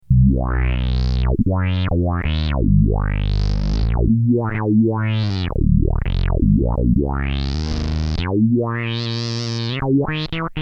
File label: xerograph fuzz